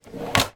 office computer desk keyboard tray slide out

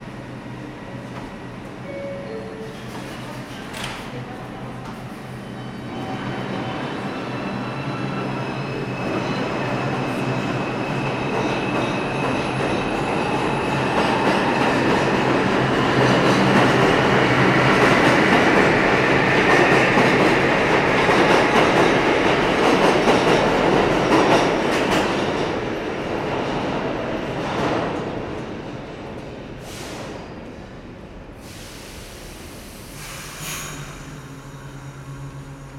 ambience train station subway nyc trains people; sound effects one

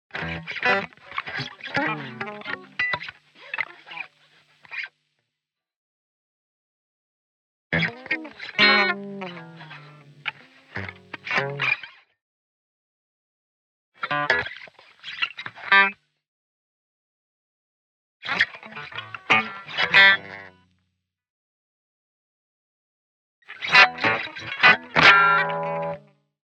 Electric Guitar Clean Pick Up; noise creations

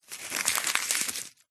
foley paper ticket screwed up handling; zapsplat

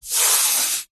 wood smooth hand fingernail rub; foley; zapsplat

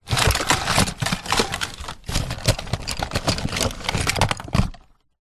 leisure toys rummage box

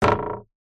sport diving board bounce wobble; zapsplat

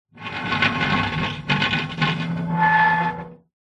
foley castle dungeon door metal large heavy open

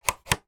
musical synthesizer assignable fader movements

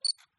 Auto focus chime

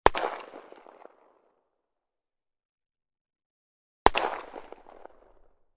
weapon gun; charles daly

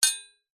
warfare knife large strike hit another clunk

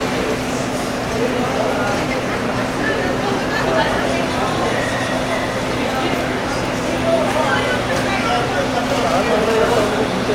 ambience airport departure lounge; jfk; sound effects one

